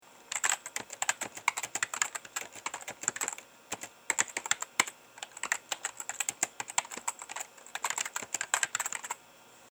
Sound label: dome switch